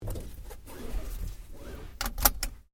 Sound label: Seatbelt On, car; Hyundai Accent 2008; Hyundai hire car; Vehicles